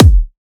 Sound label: Riddim Kick